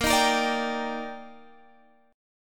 D sharp Major 7th; x,13,13,15,15,15; chordshape

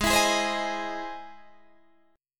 E Augmented Major 7th

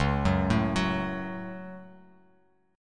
Db Suspended 4th Sharp 5th, Bass Standard; 9,9,7,11; arpeggiated

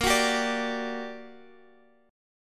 G Minor Major 7th, Bb, guitar chord

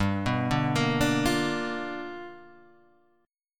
G 7th Sharp 9th G, chord; G7#9 Chord {3 2 0 3 3 1} arpeggiated

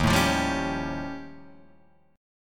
D sharp 9th Flat 5th, Guitar, Drop D; 1,0,3,0,2,3

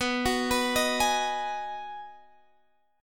B 6th B down Guitar Drop D

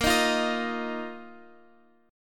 A sharp Suspended 4th A sharp down Guitar Open F; strummed

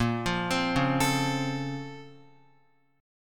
A sharp Minor Major 9th, down, Guitar; A#mM9 arpeggiated; JGuitar

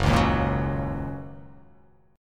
Gb 6th Gb down; Gb6 strummed; JGuitar

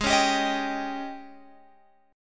Ab Major 7th Suspended 4th Ab Guitar Open G; down

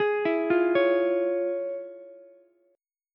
F Minor Major 7th Sharp 5th Ukulele; FmM7#5 arpeggiated; JGuitar

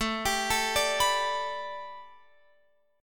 A 7th Suspended 2nd Suspended 4th Guitar Open F; A7sus2sus4 arpeggiated

